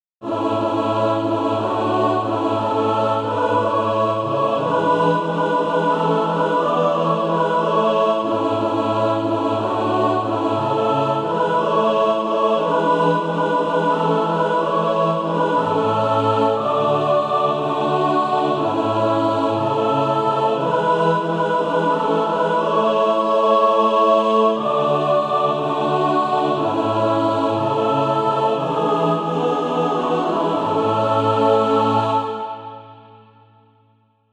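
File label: mixed, SATB Practise Music; Christ Is Waiting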